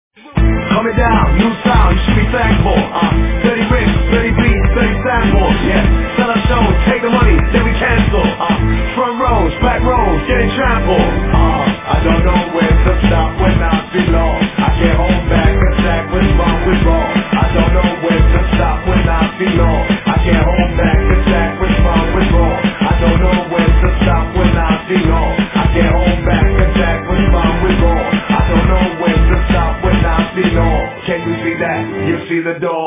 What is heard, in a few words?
Dirty beats